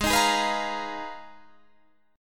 Ab Minor 6th Add 9th, Ab, Guitar, Standard; strummed; JGuitar

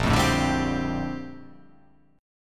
A sharp Major 13th A sharp

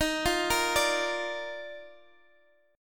D sharp Major 7th Suspended 2nd, Guitar, Open G; chordshape; x,x,13,10,11,12

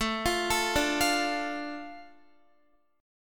A Suspended 4th Sharp 5th, Guitar; Asus4#5; arpeggiated; x,12,15,14,14,12

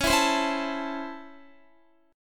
C sharp Minor Major 7th Sharp 5th C sharp Guitar; C#mM7#5 strummed; JGuitar

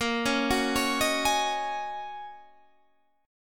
Gb 6th Add 9th Bb, guitar

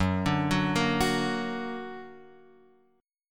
Gb Augmented Guitar Open F; x,1,2,1,1,1; Gb+ arpeggiated

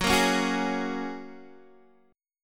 Gb Major Add 9th, down, Guitar, DADGAD

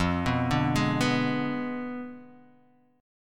F Diminished 7th F down Guitar Half Step Down

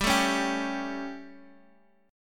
G Suspended 2nd Flat 5th, Guitar Drop D; chordshape; down

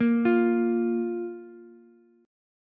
A sharp 5th A sharp Ukulele; arpeggiated; JGuitar

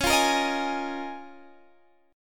C sharp 6th Add 9th C sharp down Guitar Drop D

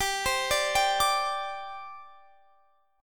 G Suspended 4th, Guitar, Standard; Gsus4 arpeggiated; JGuitar

